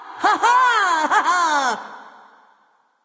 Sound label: laugh; buffed; smite; nike